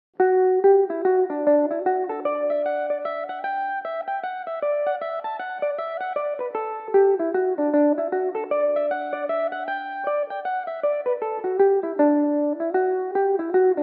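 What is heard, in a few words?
D major belmuse, irish mandolin; The Old Butter Road; Belmuse EM-190 electric mandolin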